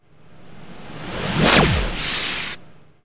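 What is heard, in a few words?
FX right, attacks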